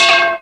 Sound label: METAL, ELEC PRCS; East West; Global Sounds